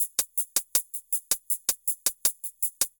hihat, Drum+Bass, 160

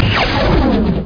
MISSILE; Starfighter

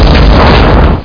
GROUND; tv3d

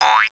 Twang; Princess and the Pea; SoftKey

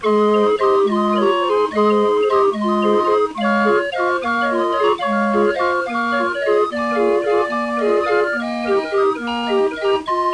hurdy loop

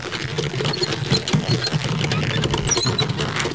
Car; SERennen

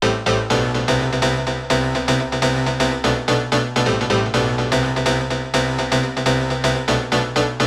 piano house 125 A; eJay; Keys

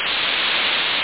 steam his; duke3d

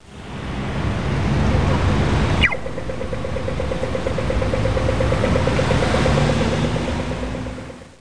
amb

